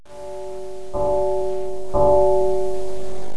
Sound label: chime vol; autodesk animator